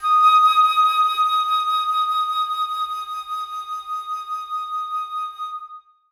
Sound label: flute d#5; Sonatina Symphonic Orchestra